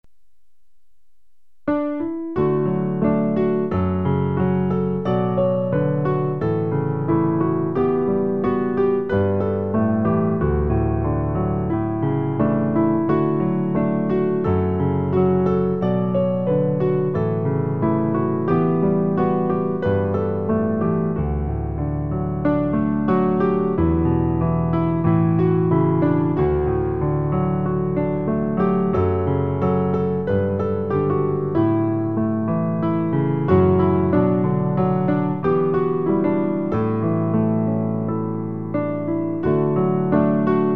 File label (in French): piano; Adorons le Seigneur; Pour accompagner le recueil Louez Dieu.